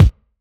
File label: DnB Kick; drumnbass 170bpm; Drum Kits; musicradar; Drum Hits A